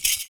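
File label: PERC, percussions; Univers Sons - Raricussions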